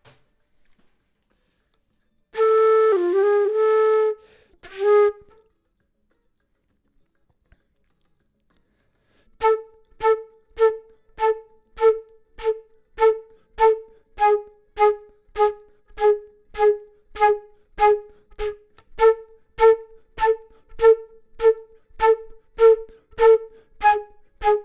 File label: flute; logic